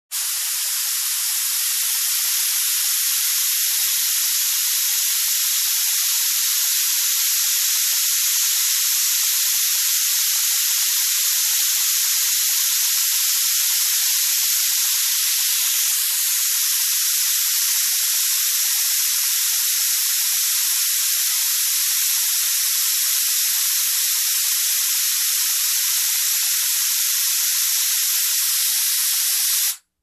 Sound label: Paint Sprayer Machine